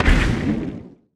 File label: asteroid collision interstellar small; Factorio